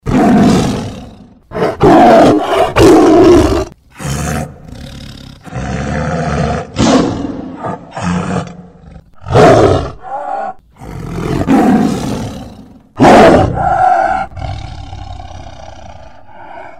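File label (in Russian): звук тигриного рыка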